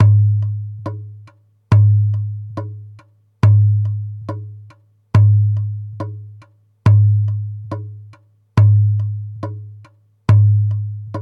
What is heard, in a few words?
Djembe 4 temps 68 bpm; Djembe 4 temps 70bpm; Accompagnements Boogie